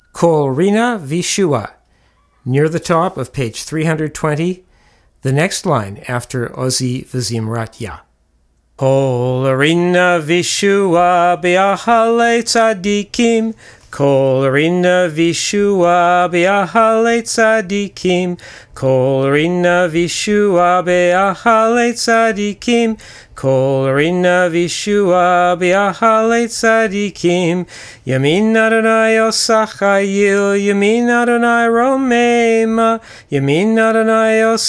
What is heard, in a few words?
Psalm 118 Kol Rinnah vi Shuah